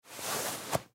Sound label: Tennis Ball Out, Tennis Ball Pull From Pocket; SoundEffects-Blastwave; Sports